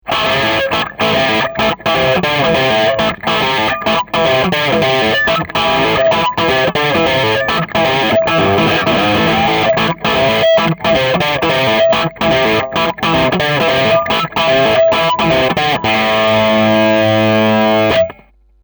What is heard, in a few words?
Bridge Crunch